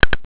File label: button